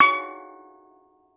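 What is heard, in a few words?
STEEL DR, Steel Drum Lead Pan, Pitched Percussion; E-MU Producer Series Vol. 7 – Old World Instruments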